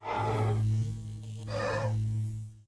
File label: mech mander idle, monster